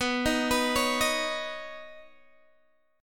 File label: B Major Add 9th B, Guitar, Open G